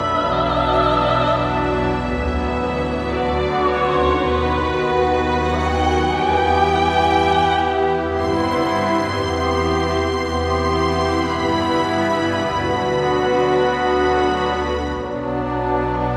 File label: Orchestral Plenitude